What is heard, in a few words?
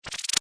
multimedia max min interface sound spinning max; Multimedia Interface ' Maximise ' Sound ( Spinning Style Tone ) Ideal For Maximising Of Br; Tones; Multimedia ’Maximize’ Sound; Sound Design; McKinneySound